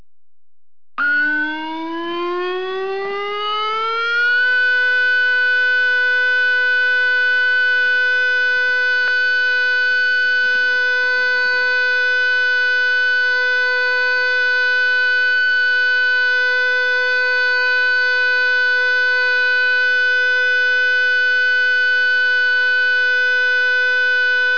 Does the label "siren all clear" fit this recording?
Yes